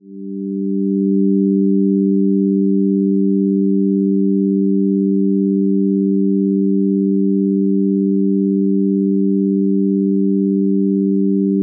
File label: music emotional piano